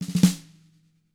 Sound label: RUFF, SNARE; ILIO; Double Platinum Drums 1